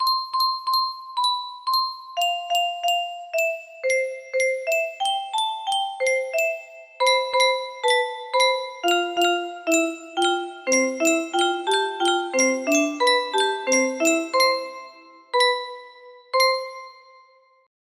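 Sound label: violin, music box melody; downton abbey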